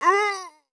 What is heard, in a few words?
scream; barrage